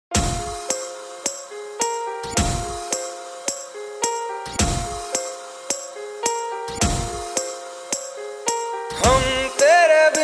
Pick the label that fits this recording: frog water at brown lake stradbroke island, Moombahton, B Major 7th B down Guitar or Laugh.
Moombahton